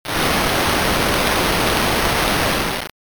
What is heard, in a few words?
fire